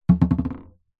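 leisure ball rubber gentle bounces on hollow wood surface boomy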